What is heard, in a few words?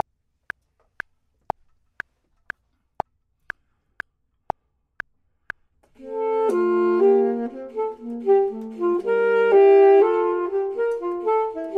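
both, Saxophone and Clarinet; voxman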